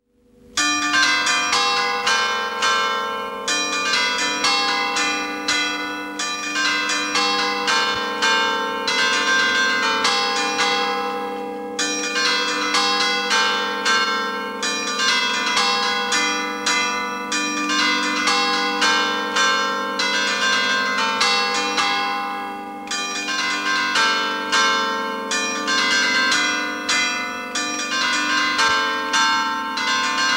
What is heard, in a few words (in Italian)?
campane